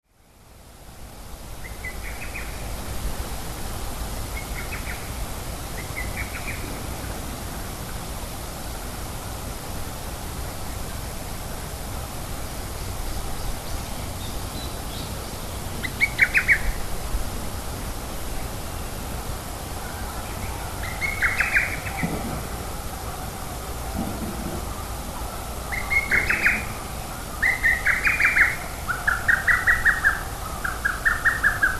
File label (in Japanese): hototogisu